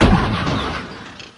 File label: kart hit